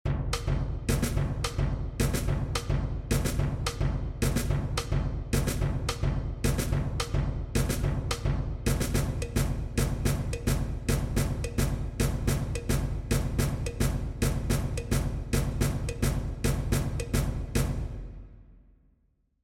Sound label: perc